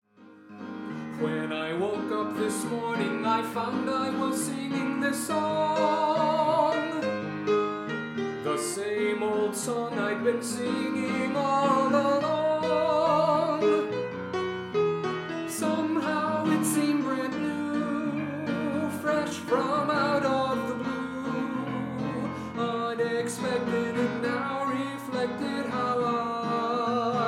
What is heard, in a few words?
Morning Waltz